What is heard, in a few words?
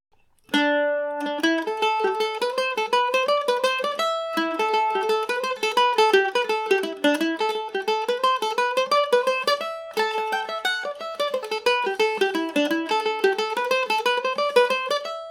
farewell to the white horse, mandolin; G&O #34 mandolin